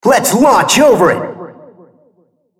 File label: attack; RumbleSkin03